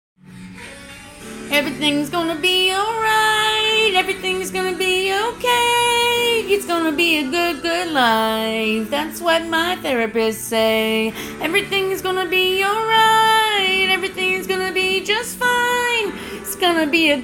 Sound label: Mess chorus